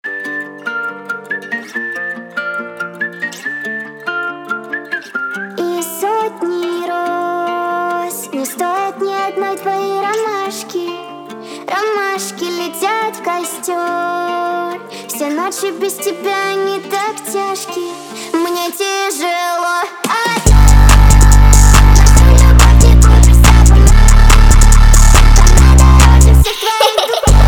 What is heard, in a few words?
Type Beat 142 bpm; G minor